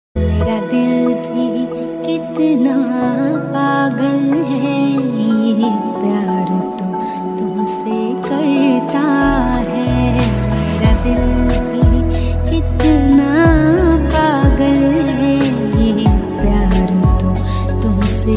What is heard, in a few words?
Female Ringtone